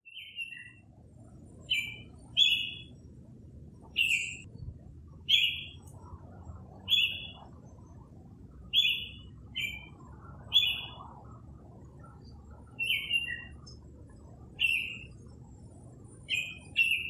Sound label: Icterus galbula; exhibicion jardin; conservacion naturaleza